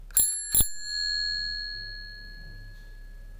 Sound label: bell, bike